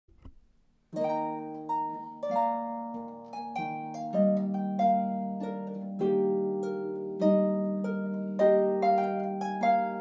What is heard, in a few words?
Trumpet Tune, Harp